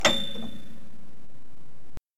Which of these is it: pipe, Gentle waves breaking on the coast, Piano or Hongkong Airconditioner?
Piano